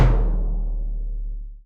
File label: Bd Punchy; Torchlight III; PS4